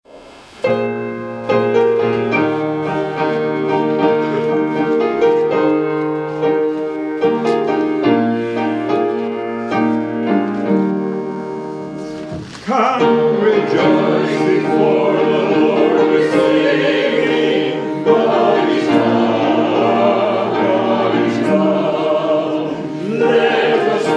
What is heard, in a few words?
Hymn; Come, Rejoice Before the Lord with Singing